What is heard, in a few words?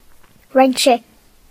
wrench; gcompris-data